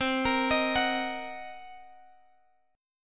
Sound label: C Minor 7th Flat 5th Guitar Open G, chord